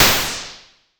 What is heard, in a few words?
Cwejman S1 Noise Sheen